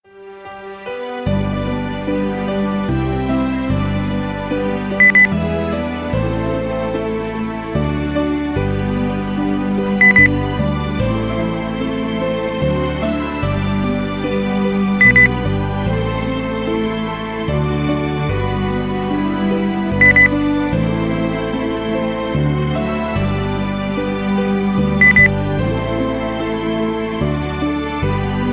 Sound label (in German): weihnachten am weihnachtsbaum beep